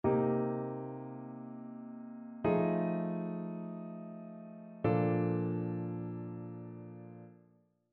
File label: ii V I progressions; ear training